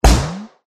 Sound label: Balloon explosion